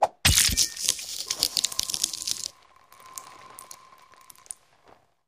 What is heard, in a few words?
Beheading Axe, whooshes & swishes